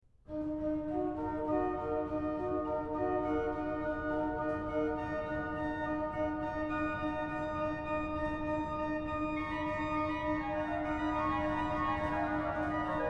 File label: Concerto in d Grave, ORGAN; Andante; ORGAN CONCERTI; BWV0596